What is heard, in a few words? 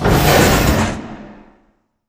sliding doors open slide; beacon; Titanfall 2